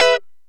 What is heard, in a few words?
Lounge Guitar Chick Major E3; Zero-G Kocktail Kollection